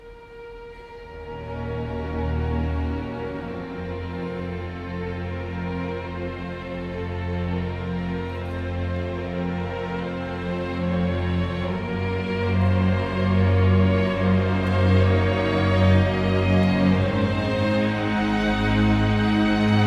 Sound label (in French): adagio cordes; barber